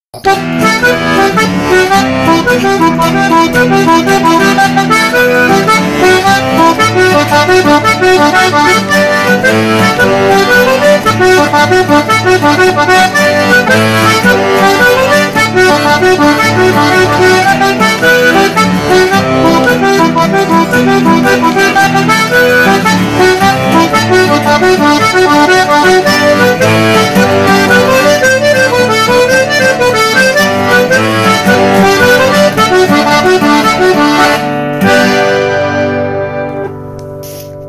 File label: Irish jig